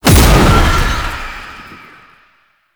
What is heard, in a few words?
Cast Impact; Warframe; Uriel; Demonium